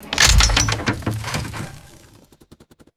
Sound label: heavy metal drop and wood debris crash